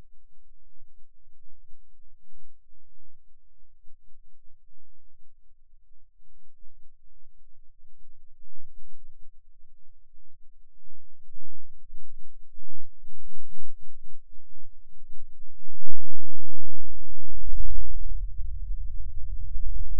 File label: Rhythm Seizure, eeg